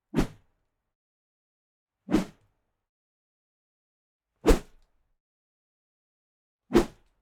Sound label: Wooshes